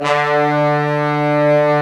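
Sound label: low brass, fat pop section; Roland L-CDX-03 Disk 2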